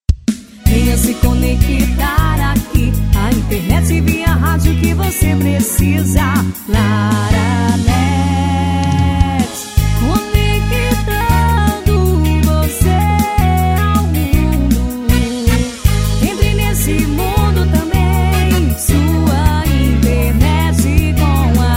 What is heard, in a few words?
JINGLE; LARA NET provedor